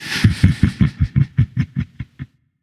laugh; smite; ahmuzencab

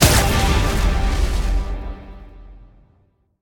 fire; smite; baronsamedi skin7

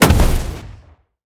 hit; smite; baron samedi

